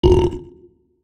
yeti burp

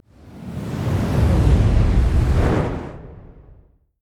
summonerspell base exhaust; LoL

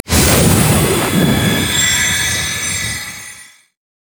reap the whirlwind; LoL; janna

